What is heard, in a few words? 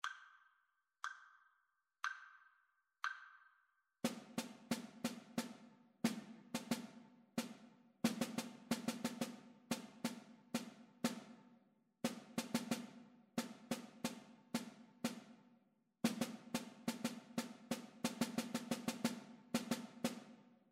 rhythmic dictation